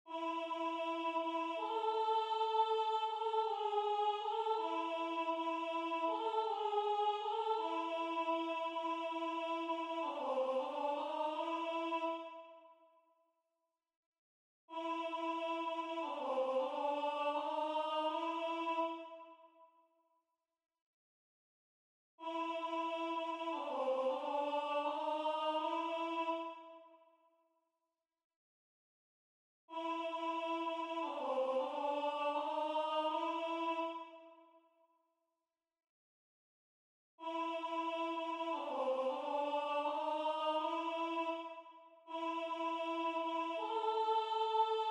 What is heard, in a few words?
Alto, Religious Folk Songs Of the Negro